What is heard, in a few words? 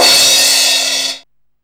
TOM Cymbal, Sequential Tom drums